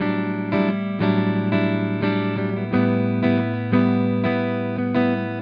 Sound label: Clean Electric Rhythms, Rock Guitars; ACID MUSIC